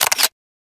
taking picture with camera

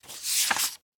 flipping page

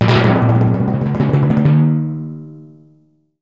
kettle drum roll

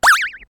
cutely jumping up, anime

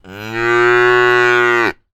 holstein cow mooing

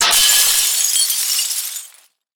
breaking glass

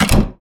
closing wooden door

